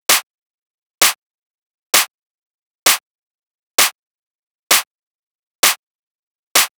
Clap Loop 130, Techno

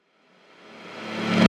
Reverse Impact; House; FX; Fiery Reverse Impact; Reverse Impacts

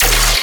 Bass Hit D#, Dubstep; Radiance Bass; Ghosthack; AC2024 - Day 13 - Dubstep Sounds 2025

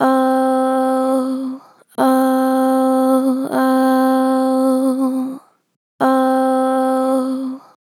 Gen Vib Ohh, Vocals, Natural Vibrato Gentle; Harmor Vocals

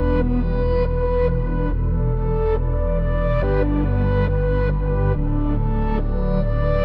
140 BPM E Min, Hip Hop Melodies Reverse; Twilight Zone

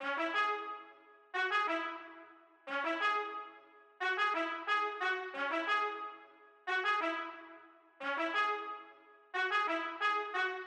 Classic Melody Loop, 90 BPM C# Min Brass; Melodics; Loops; Loop Stems; Cymatics; Eternity

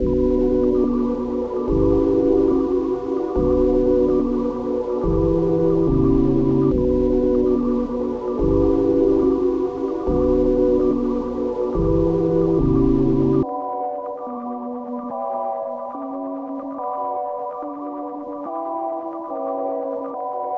143 BPM B Min, Melody; Charge; Cymatics; Cymatics - 2022 Melody USB Expansion

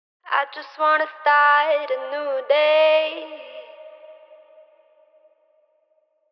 Phrase Wet - 70 BPM C Min, Vocals; New Day; Cymatics - Eternity Sample Pack; Phrases

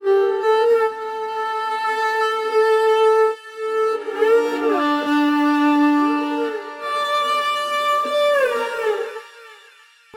142 BPM G Min Strings, Drill; Melody; Loop Stems; Cymatics; 2022 Melody Collection; Pagan

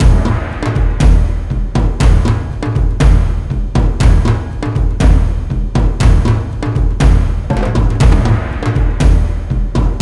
120BPM, Percussion Kits; Ghosthack; SkyRealm; Natural Selection

